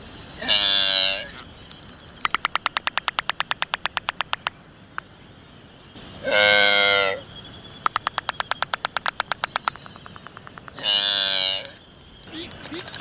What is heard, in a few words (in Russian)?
albatros chernobrovyi